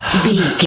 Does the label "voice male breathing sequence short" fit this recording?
Yes